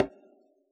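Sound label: conga high mute, Yamaha DJX Rock Kit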